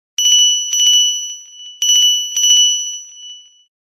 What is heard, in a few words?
Alarm; Pantech Perception